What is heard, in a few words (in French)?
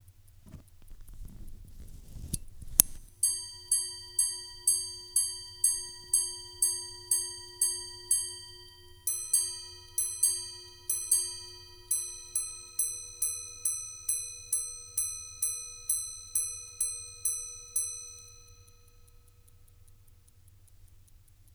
enregistrement vacheron platine, sonnerie; David Chokron